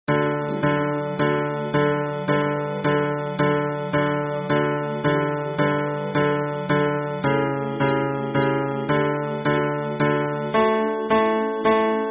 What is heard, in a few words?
hymn bass